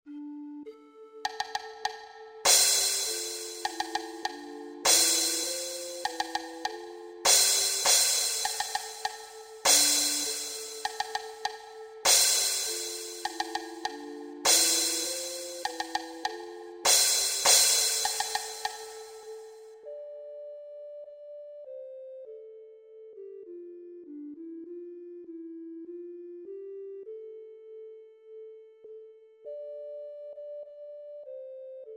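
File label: Percussion; Viriato el pastor guerrero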